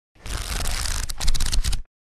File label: Book flicking through pages